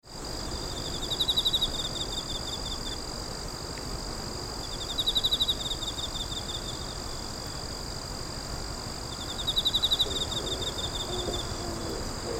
bird pigeon

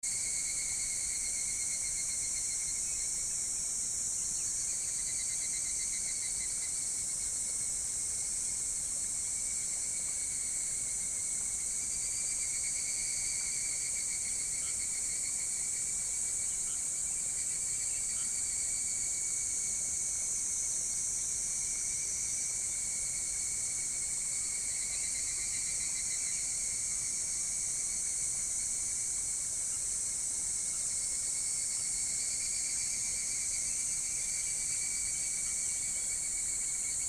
rice field

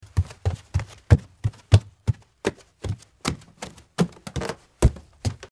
dotadota

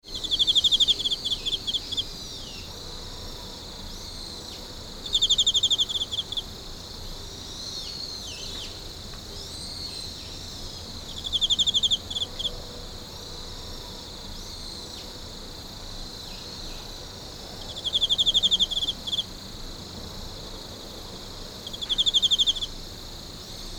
singing of insect

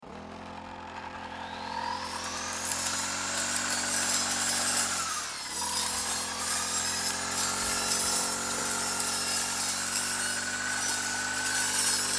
grass cutter

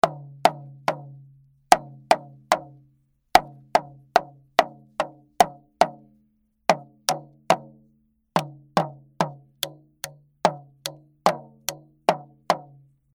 toy drum

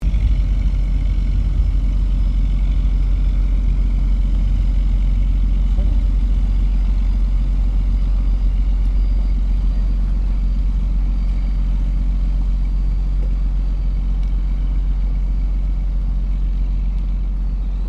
Kamome Park, Nagahama

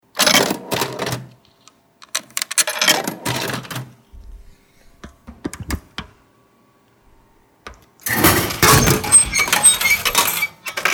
vending machine

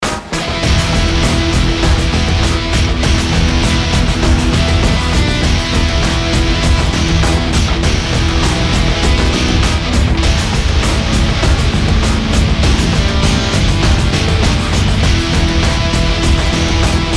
JINGLE rock guitar

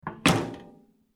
microwave close

